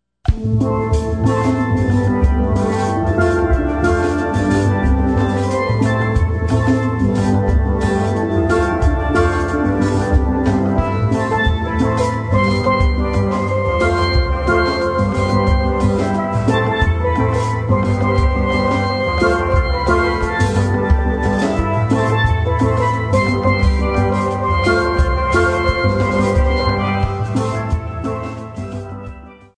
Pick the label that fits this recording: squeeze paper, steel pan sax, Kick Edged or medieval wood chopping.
steel pan sax